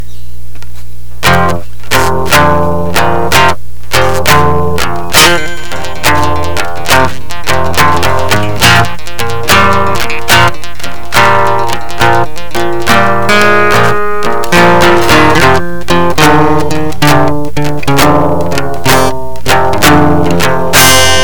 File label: guitar blues improvisation